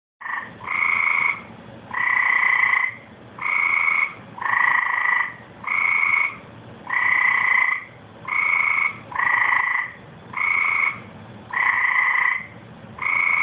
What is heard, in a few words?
chorus frog